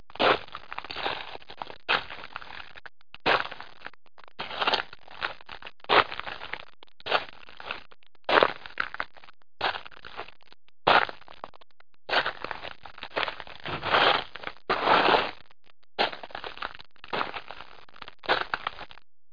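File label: footstep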